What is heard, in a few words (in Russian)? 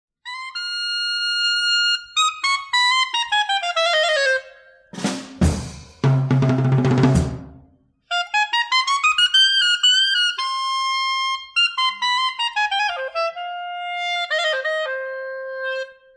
instrumentalnyiy sekstet